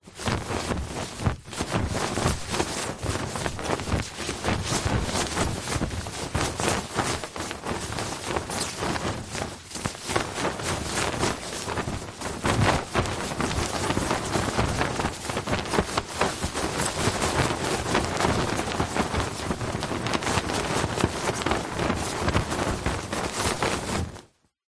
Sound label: foley flag flap strong wind